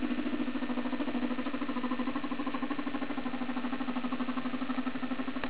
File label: hello, guinea pigs